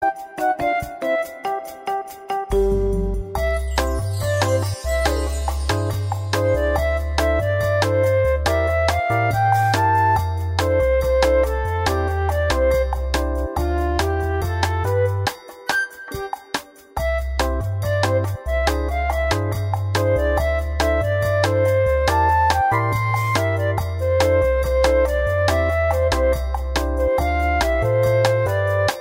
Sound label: Elevator Music; Mario